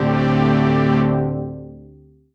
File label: Dm, Chords; SmoothSnake; M8; Electronisounds